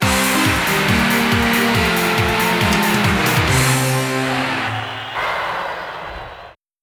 Own Goal; super sidekicks 3 the next glory; Neo Geo CD

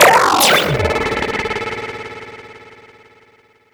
Troll Protector Beam Start

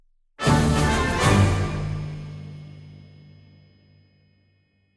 Jingle Victory

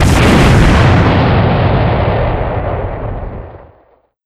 Explosion; SE Manzai#21 (SE129 Man Explosion)

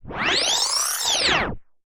Roulette Spin Start

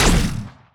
Wallhit Spreadbullet

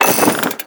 UI Scene Transition End; skylanders cloud patrol

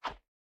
Swing Tiny

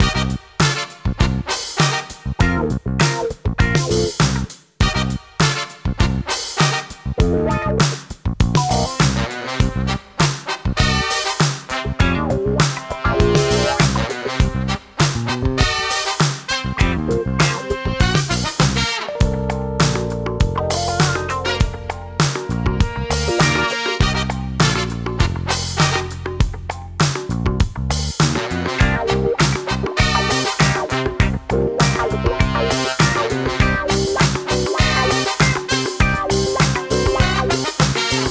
Funk; Mister Mosquito (PS2)

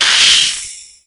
Spider Die; Wild West Shootout (Arcade) (gamerip) (2019)

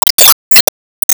Zantetsuball Hit

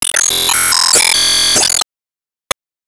Top league Bonus match

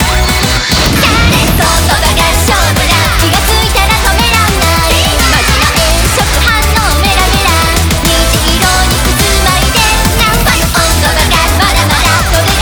BGM Reach

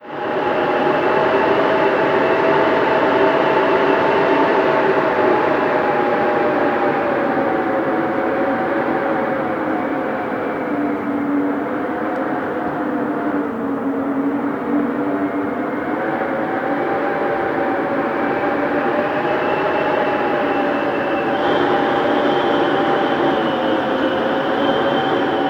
Nile river Wind howl

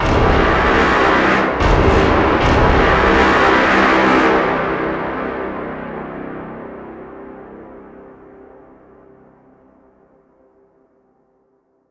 Start Menu Stinger; extinction deluxe edition; ps4 windows xbox one

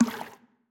Move Leaf

